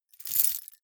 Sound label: key ring large rattle air; objekte; schluessel